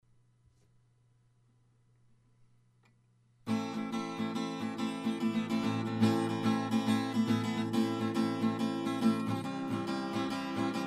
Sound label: acoustic